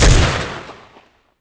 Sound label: pistol shot; Pirates Of The Caribbean; DUEL